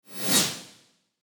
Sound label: Smooth high frequency whoosh; transition